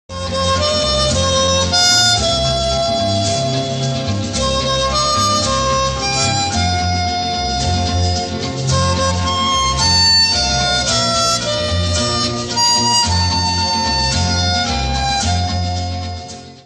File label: happy birthday ringtone